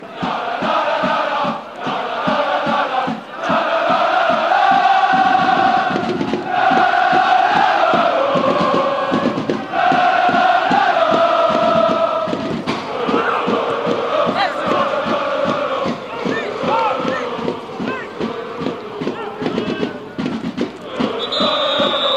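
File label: chant lens, football chants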